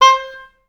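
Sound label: oboes staccato C4, orchestra; OBS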